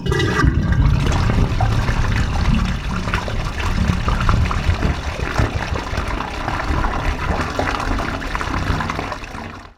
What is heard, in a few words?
toilet, Sanitation Pipes; SFX